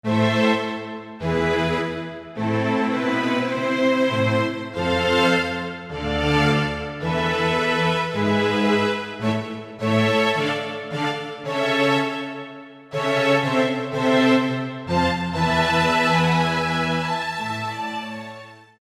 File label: New Strings, Roland SR-JV80-01 Pop